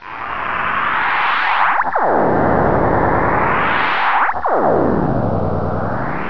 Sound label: hc die; svencoop; keen